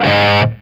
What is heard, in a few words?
SOLDANO ROCK, Guitars